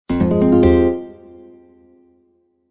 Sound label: New Voice; Sony Ericsson P990i